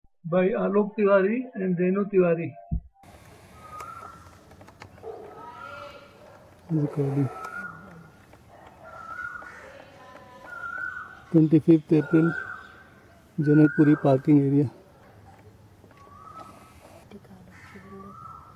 Asian koel sound

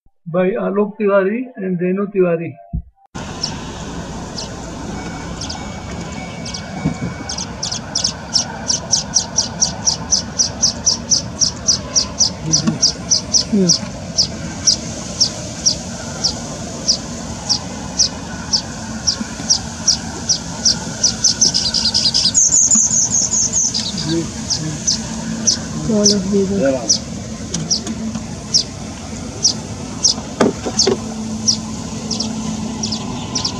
Streaked weaver; alok

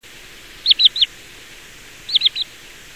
Tringa glareola (song)

